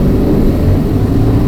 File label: third shift down, car; vehicles; garrysmod; sincar